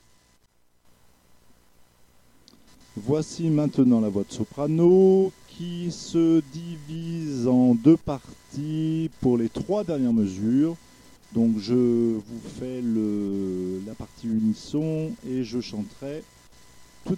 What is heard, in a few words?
Soprano